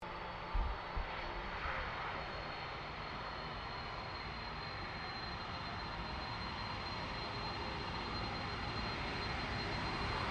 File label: 1995 A320 AIRBUS LANDING; Airplanes; Vehicles